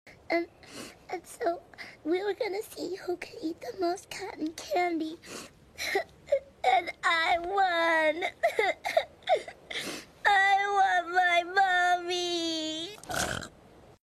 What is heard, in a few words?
i want my mommy burp